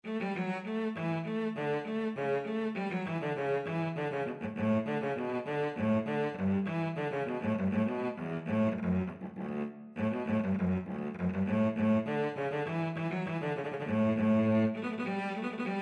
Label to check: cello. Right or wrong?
Right